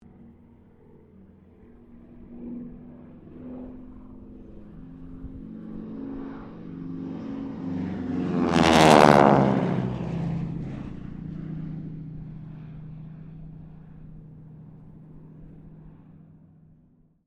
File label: Harvard squadron 4 pass, stereo; Mixed aircraft squadrons; Airplane; Airplanes; Vehicles